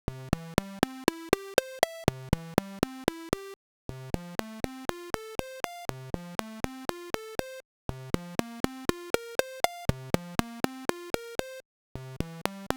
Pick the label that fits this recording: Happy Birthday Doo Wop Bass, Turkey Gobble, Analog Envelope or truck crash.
Analog Envelope